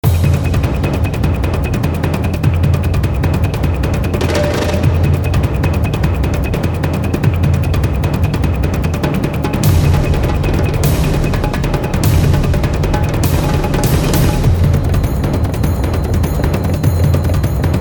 fight 200bpm; hunter

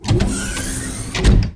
door ship

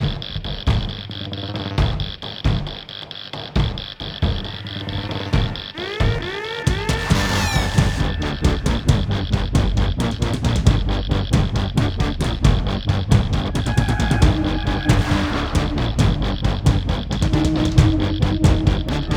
Driving Music; Mission

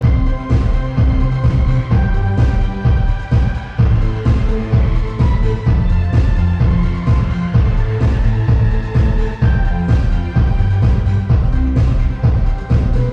Music Party House; Calling All Mixels; 2014 mobile gamerip

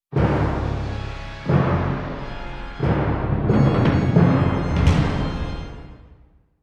sting vampire bite; The Sims 3; Windows

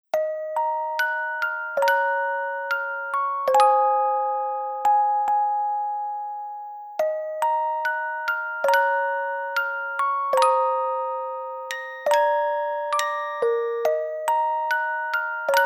Orgel Ver.; Magna Carta II

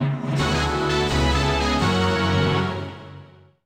Jingle - Transmutation Learned; Fullmetal Alchemist 2 Curse of the Crimson Elixir

